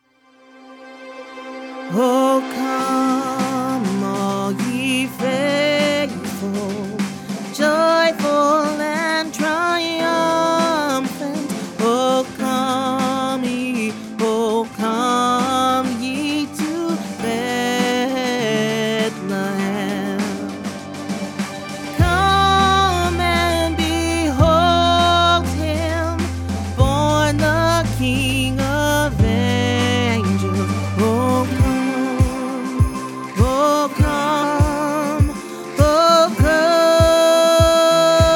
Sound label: Lead Only; christmas choir